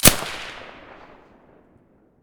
silencer dvl10 stereo

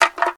energy drink; STALKER; IWP

